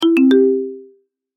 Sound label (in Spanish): alerta chat